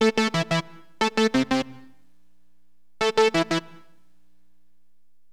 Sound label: JUNO, HipHop, Keyboards; LiveAct Pool 1